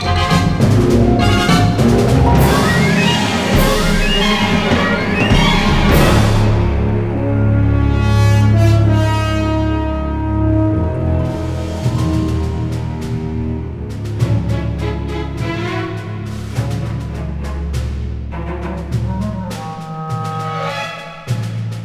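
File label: Low Combat loop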